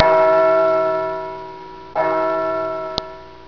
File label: CLOCK